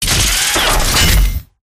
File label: plant; gow; silverback